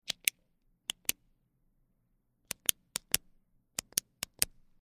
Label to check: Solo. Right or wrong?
Wrong